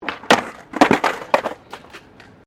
SKATEBOARD SKATE BOWL DROP; Skateboard sounds; Skateboarding; Sports; Foundation4

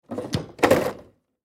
Foosball Table; Players Double Move Spin; SoundEffects-Blastwave; Sports

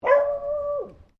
Animal Dog Beagle Female Bark Distant; Various domestic dogs; Dogs (Sound Effects:Animals)

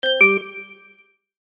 Chime Musical, Chime Musical Multimedia; Web Interactive; Chimes (Sound Effects:Multimedia); Blastwave; Technology

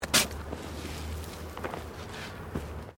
Digging Shovel Sand

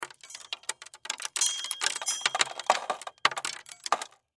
Crash Debris Drop Metal Surface Small; Sound Effects:Materials; Blastwave

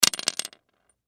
Thumb Tack Multiple Drop Wood Desk; Office; Blastwave

